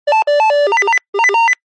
cb radio call ring tone random

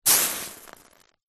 horror acid burn; zapsplat

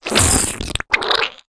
cartoon squish squelch wet; zapsplat

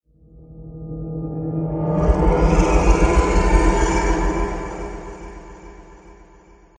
transition passby

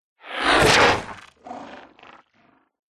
science fiction movement slimy weird; zapsplat

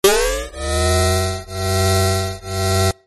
science fiction beam lightly rising

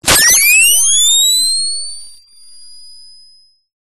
science fiction device power charge high pitched

